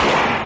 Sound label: gun shot; Crash Bang Boom